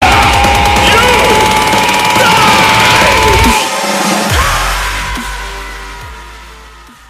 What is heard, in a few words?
kamehameha dubstep remix